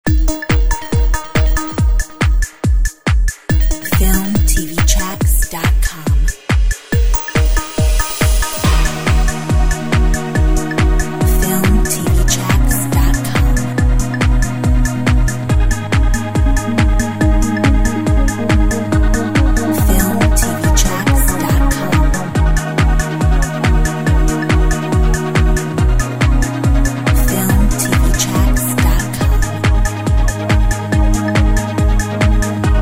Techno

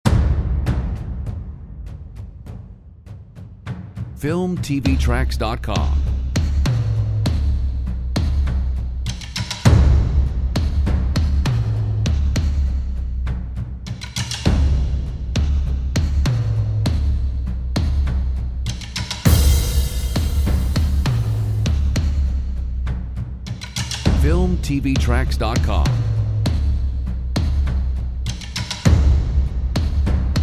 Brave Percussion

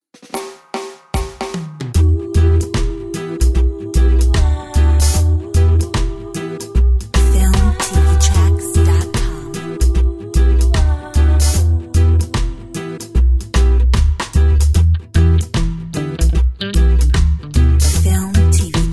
Reggae soul no vocal

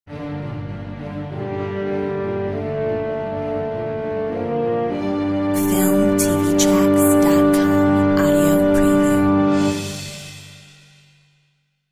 orchestral; Logopack; Filmtv-tracks

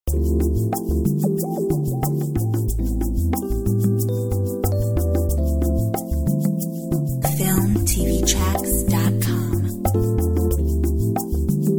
Jazzy Love; FILMTV-TRACKS

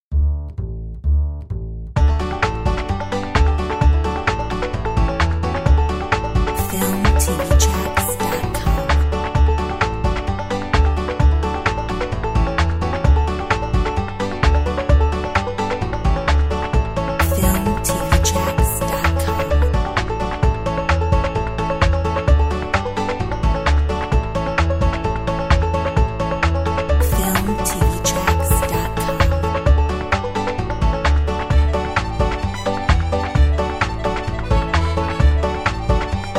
Country

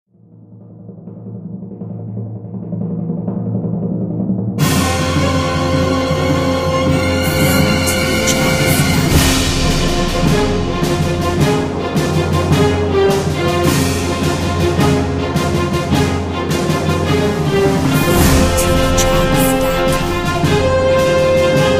Action Game

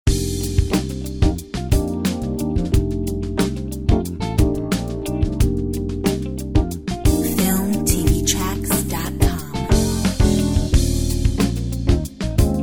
Back in Groove

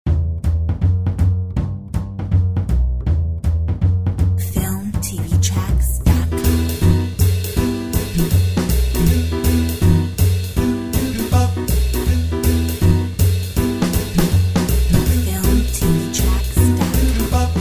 Swing

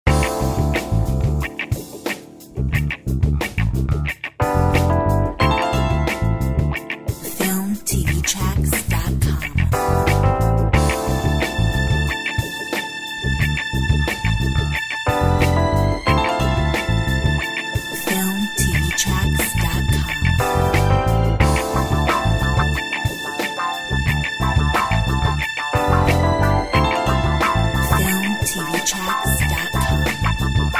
Soul orchestra; Filmtv-tracks

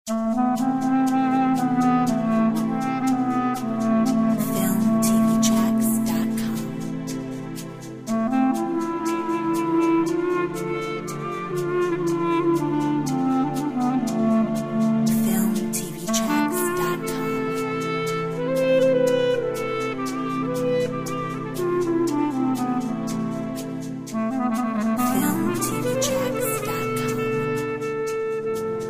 Klezmer Soul; Filmtv-tracks

